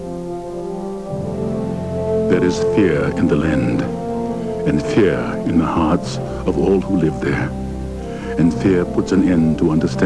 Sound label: cry